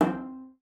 C4, CELLO FINGER; Xsample VOL-5

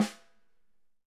Ludwig Snare, EMU PX-7 Drum Machines

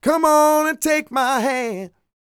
SING, Vocal Planet R&B & Dance; ILIO

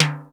LO TIMB; Crypton - DrumBase5000

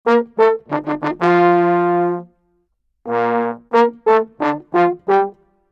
Dmn7 Cmn7 Dmn theme, brass; 90 s Sample CDs; PowerFX - Downtown Orchestra

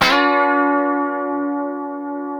C 4 FUNK CHORDS, Guitars; Spectrasonics; Hans Zimmer Guitars VOL-1

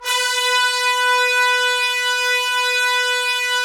Trumpets; Roland - LA Composer vol. 4

